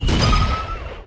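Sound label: fast launch from mortar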